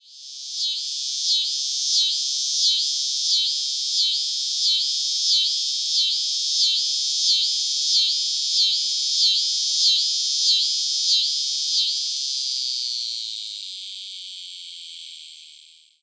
Neotibicen pruinosus fragment, cicadas, filtered; MS; East of the 100th Meridian

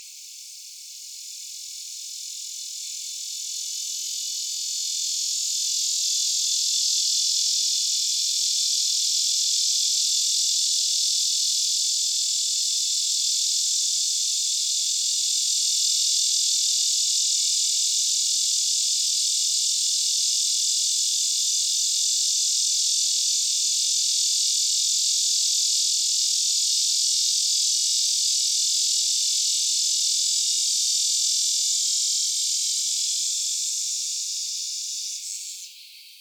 Neotibicen lyricen, cicadas, filtered; US; MS; East of the 100th Meridian

